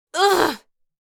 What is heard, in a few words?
Adult female pleasure moan